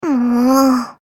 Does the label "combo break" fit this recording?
Yes